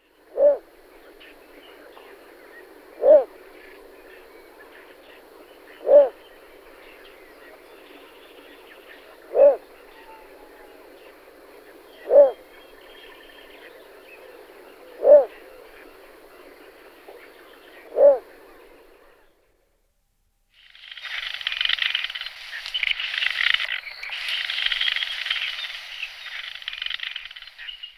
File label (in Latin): Ixobrychus minutus; All the bird songs of Britain and Europe; Jean-Claude Roche